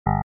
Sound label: basso mac os x